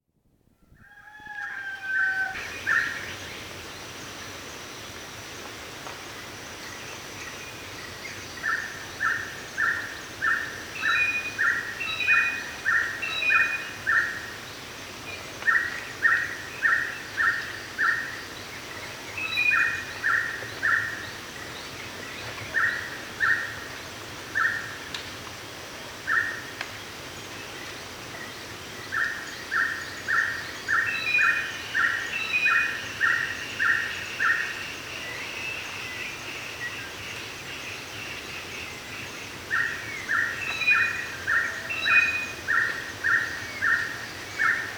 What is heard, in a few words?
Awaking In a Forest; Dr. Sound Effects; 210 Nature Sounds - 20 Hours of Relaxing Natural Ambiences for Meditation and Sleep